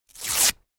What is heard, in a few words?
paper rip